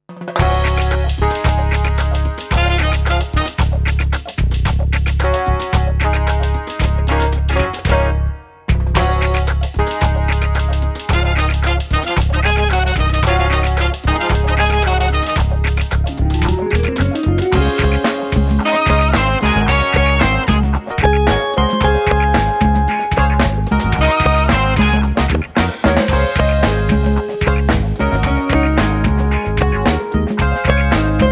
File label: Warm Up Single, drum play alongs; DPA